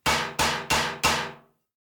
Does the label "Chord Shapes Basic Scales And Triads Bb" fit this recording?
No